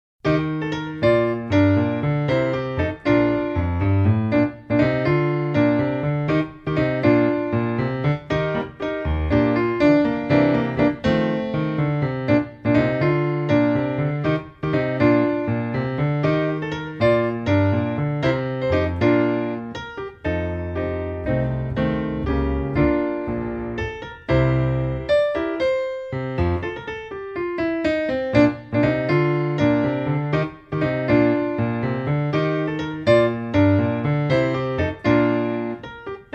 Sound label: accompaniment; song of the south